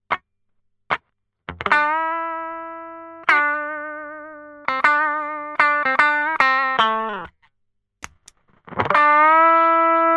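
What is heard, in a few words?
Without / With Sardine Can (Compressor + Rectifier + Color Brown), guitar; Celmo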